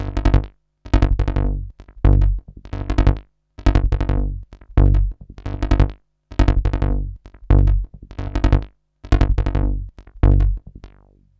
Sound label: REZO TB, BASSLINES; Masterbits - Tekkno Trax